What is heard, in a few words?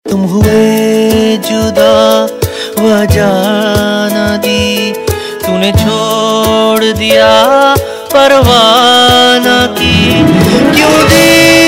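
Ringtone, Bollywood Punjabi Pop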